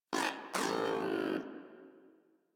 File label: Moa Aggressive Vocal Disagree; Warframe